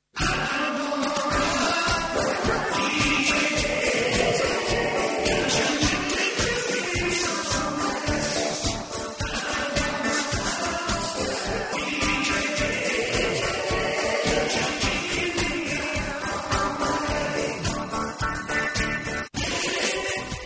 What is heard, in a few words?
Male Pop